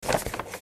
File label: flip sound